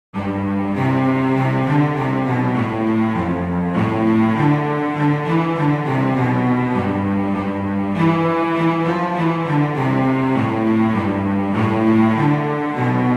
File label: We Wish You a Merry Christmas